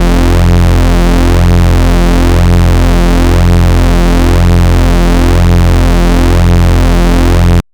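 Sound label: bass sustain reese F; Polyend; ARC Noise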